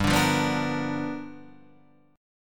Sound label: G 7th Sharp 9th, G, chord; G7#9 strummed